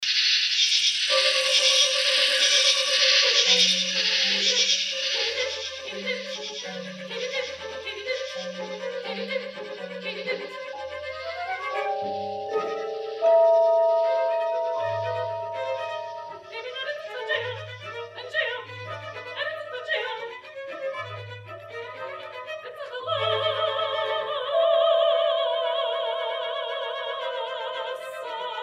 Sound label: KATYDIDS